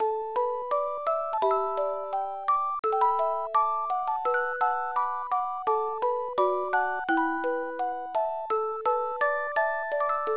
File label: Music Box; nekotako; snes